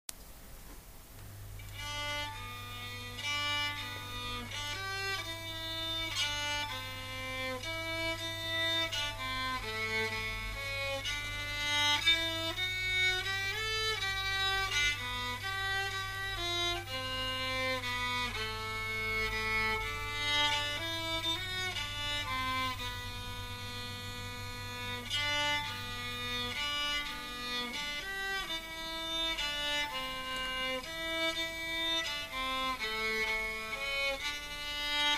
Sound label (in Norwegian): Irsk vals 2. stemme